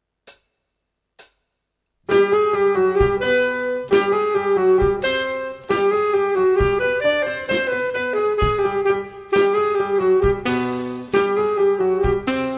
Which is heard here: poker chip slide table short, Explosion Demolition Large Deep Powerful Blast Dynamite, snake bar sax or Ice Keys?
snake bar sax